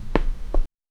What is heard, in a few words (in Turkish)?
pirmatin icinde yuruyen b; seamless